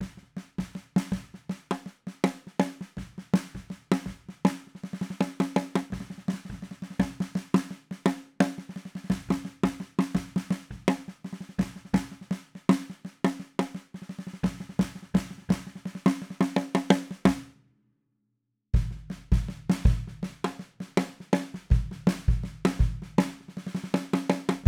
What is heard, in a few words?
Snare KM184 Modded; KM84